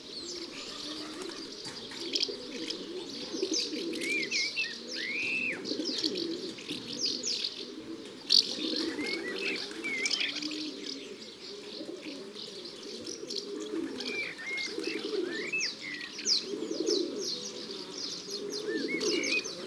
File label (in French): Pigeon domestique biset; ABC des chants d oiseaux